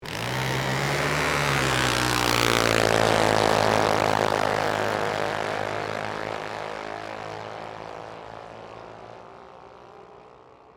Dragster Away, Sports, Dragster, Drag, Away, Two, Cars; Junior, Dragster, Idle; Race Car; BlastWave